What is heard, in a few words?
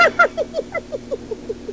tickle